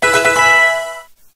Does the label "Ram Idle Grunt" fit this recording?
No